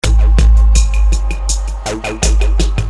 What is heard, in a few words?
Loop